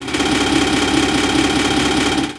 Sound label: salute